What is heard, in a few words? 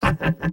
pyro laugh evil; vo; tf2; mvm